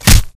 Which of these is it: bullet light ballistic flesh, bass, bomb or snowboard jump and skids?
bullet light ballistic flesh